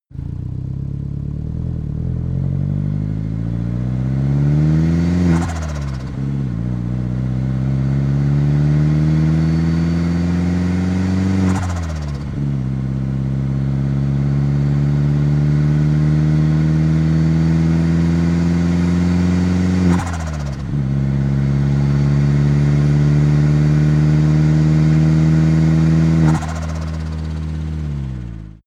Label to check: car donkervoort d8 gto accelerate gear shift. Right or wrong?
Right